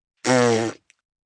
Mouth Raspberry, 1 child, short; human voice; Mouth effects; kid; Sound Effects:Human; Voice Box

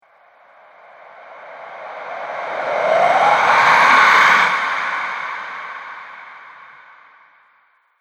Haunting Halloween Spirit, ghost

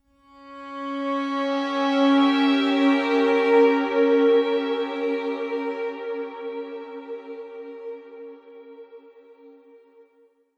Orchestra Horror Sting; Stinger

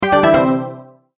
multimedia tone alert

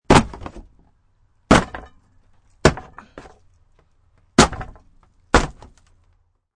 Wood Bashing, Piece of wood is bashed

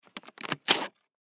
Spiral Notepad Small Page Rip Out Fast; Office; Offices; Blastwave

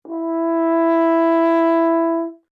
HORN E5 F LONG, French Horn in F; Alexander French Horn in F; Horns (Sound Effects:Musical)

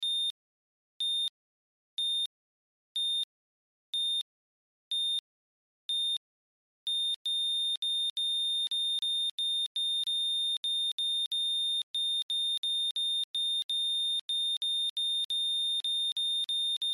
Heart Attack, Heart Attack Monitor Beep; Emergency; Beeps (Sound Effects:Objects)

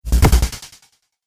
Cinematic Hit, Trailer Sound Effects

